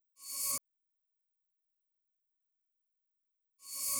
drum Loop 140BPM Rev FX; Vengeance - Essential Clubsounds Vol.5; VEC5 Drumloops; VEC5 Multi Loops 140BPM

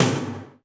Korg 01W Dance Kit; 300 Drum Machines

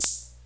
KORG SR120

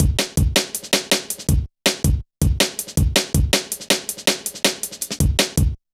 Kicker, Jungle, Drum Loops 161.5

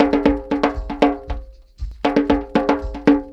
PERC, Roots Disco; USB Soundscan vol.32; AKAI

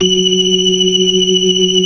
BLOOD OCT R, ORGAN

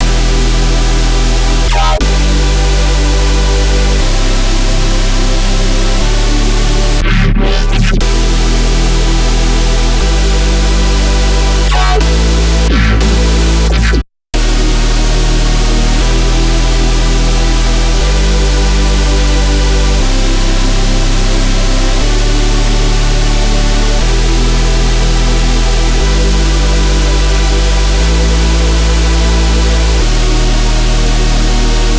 Melodic Dubstep Loop

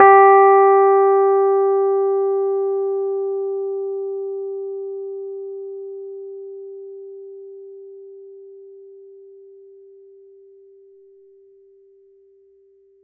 G4 HI, Rhodes Mark II; CEPCo Sample Series Vol. 3

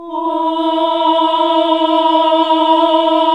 OHH E, Nuns Ooohh; Sound & Vision - Gigapack II

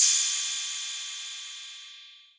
808 Cymbal; Novation Drumstation

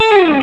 Vintage Blues Guitar, SOLO

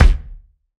BD, BASS DRUMS; EdgeSounds

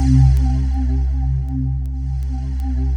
C3, Synth Leads

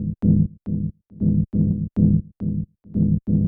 HOUSEY CHO, Techno 138 BPM; Best Service ProSamples vol.54; AKAI; CHICAGO 5 O

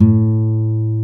A2, ACSTC.G; AKAI S-Series CD-ROM Sound Library VOL-2

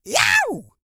YELL, MALE HUMAN; Spectrasonics Vocal Planet

